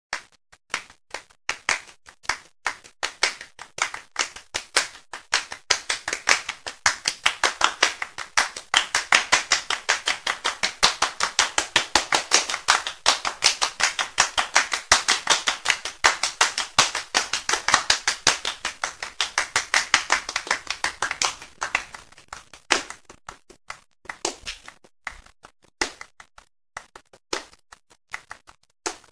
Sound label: clap